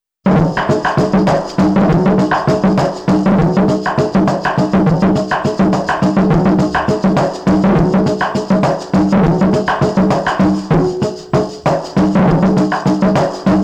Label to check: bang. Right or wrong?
Wrong